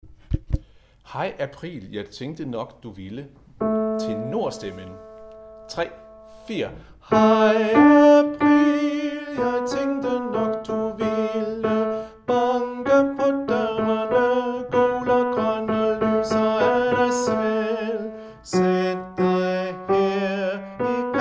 Tenor, harlev koret; Hej april!

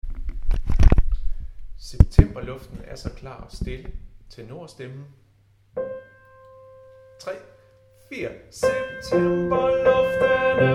tenor, harlev koret

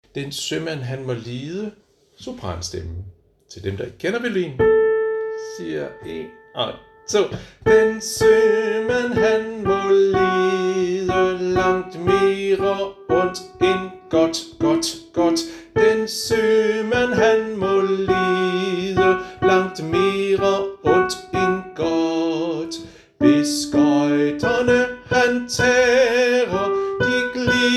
sopran; harlev koret

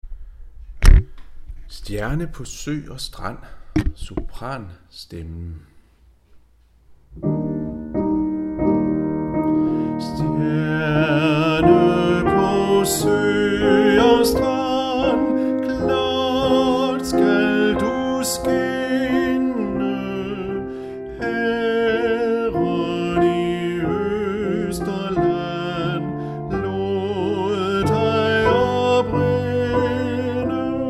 sopran; Stjerne på sø og strand; harlev koret

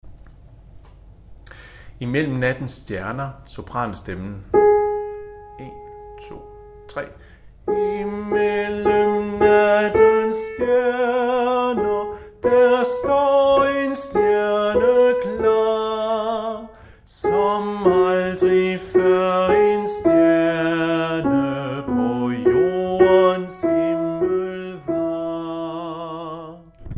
sopran; harlev koret

